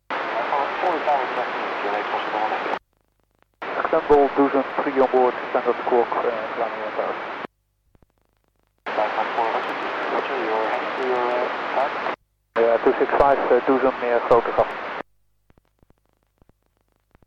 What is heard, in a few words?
lifeliner 4 scramble; to doezum